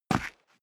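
step boots single concrete grit single stair up, footsteps; schritte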